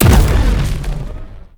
big bow explo

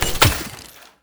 pen arrow end

